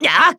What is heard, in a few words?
kiai; MonsterHunter; Fight